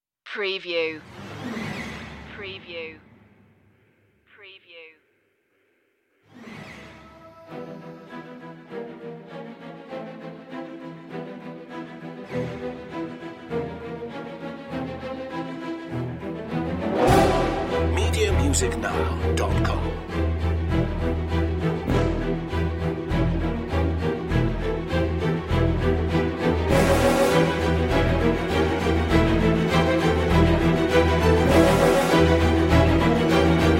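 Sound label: no percussion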